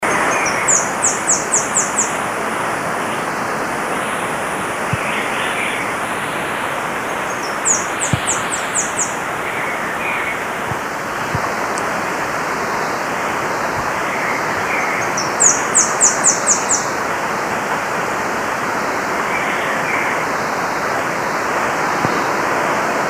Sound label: saffron billed sparrow